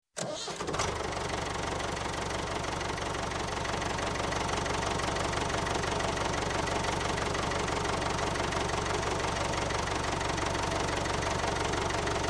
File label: Tractor, Diesel Start, Idle, Pull Away; Industry, Farm; Sound Ideas 2000 Series